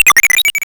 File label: Hard PCM Chip; chiptune